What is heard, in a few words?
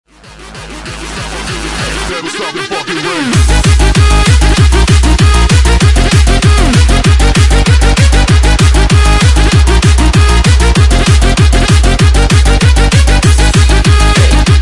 Sax, HardTek